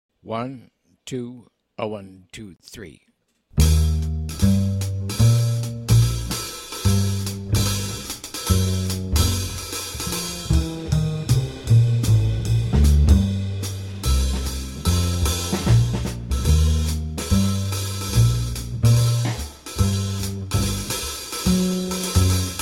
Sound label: bass drums only